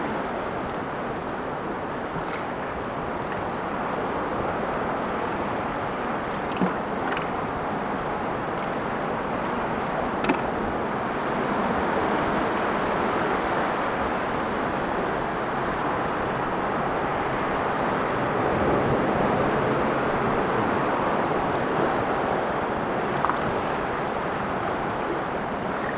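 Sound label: reefs edge loop, ambient soundscapes; dota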